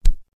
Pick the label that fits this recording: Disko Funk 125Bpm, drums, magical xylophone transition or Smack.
Smack